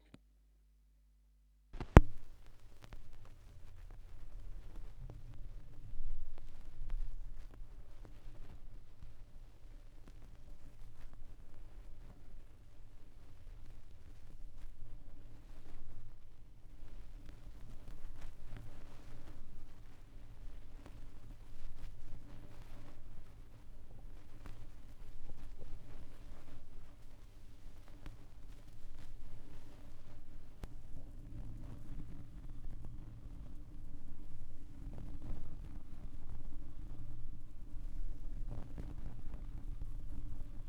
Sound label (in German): schallplattengeraeusche, Vinyl